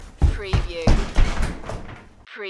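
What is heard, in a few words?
Quick Run Wood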